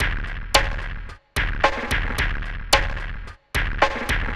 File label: Drums Strange